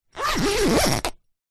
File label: sleeping bag zipper; foley